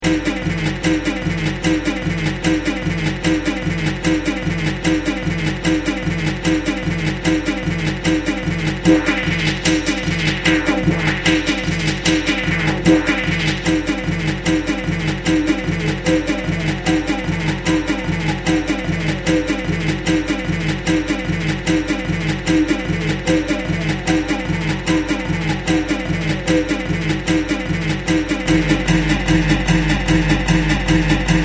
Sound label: TECHNO GUITAR